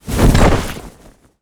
parachute land

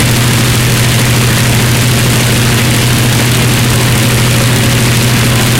mustang side, engines; War Thunder

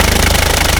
mg empty

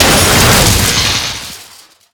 dest wires exp, Explosions

